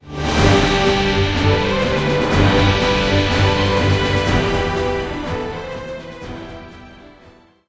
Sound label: round win